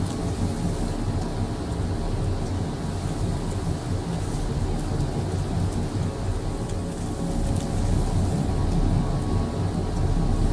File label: ship destroyer idle; Stellaris; machineage